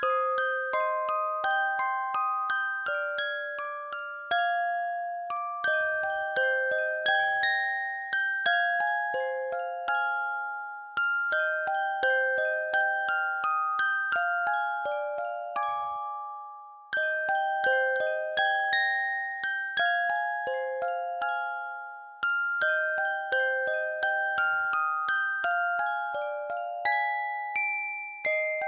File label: Haunting Music Box